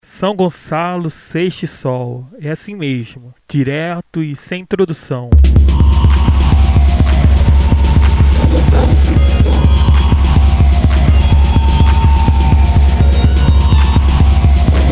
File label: speed version